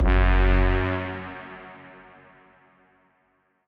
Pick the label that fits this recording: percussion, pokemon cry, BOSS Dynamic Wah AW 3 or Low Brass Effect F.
Low Brass Effect F